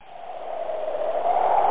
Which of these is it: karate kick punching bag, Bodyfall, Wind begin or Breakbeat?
Wind begin